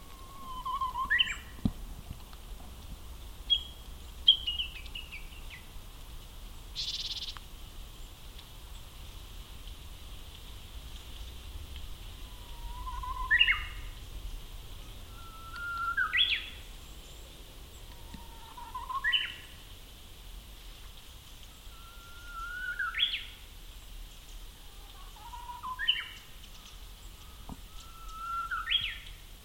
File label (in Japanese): uguisu; akasaka